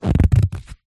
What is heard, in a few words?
househopld rubber glove stretch